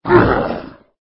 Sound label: zombie hit; Zomboid 41.78.16; Project Zomboid